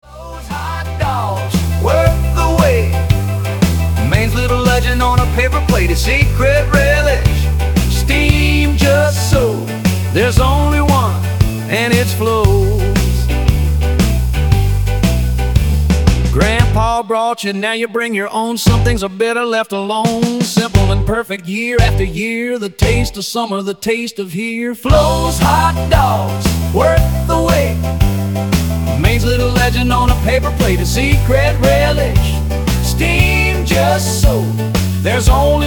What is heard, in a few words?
instore loop; flo s hot dogs